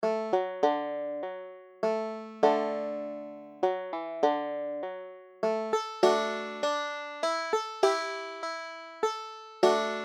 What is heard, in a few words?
country waltz, banjo